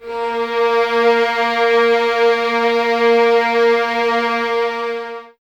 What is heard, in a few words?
Violins Exprsive, String Ensembles; Miroslav Vitous; 11 Violins